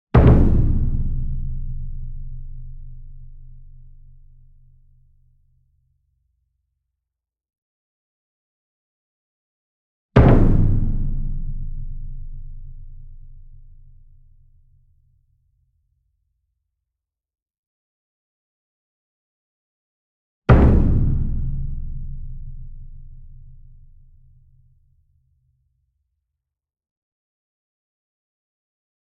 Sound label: Gong Double Soft Beater Medium; SINGLE DRUM; boom library; Cinematic Strikes Construction Kit